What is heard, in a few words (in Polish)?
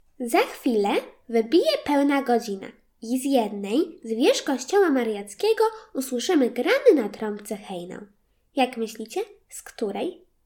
hejnal